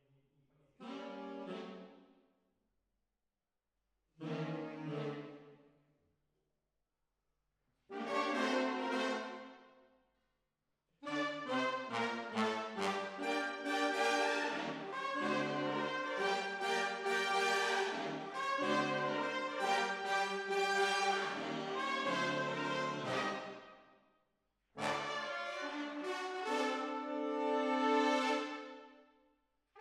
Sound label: Hall, Jazz for piano